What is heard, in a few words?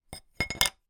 tile broken peice down on table; foley